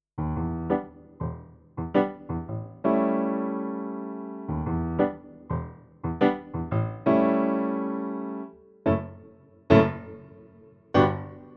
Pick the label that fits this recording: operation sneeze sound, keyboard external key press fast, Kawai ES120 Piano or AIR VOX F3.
Kawai ES120 Piano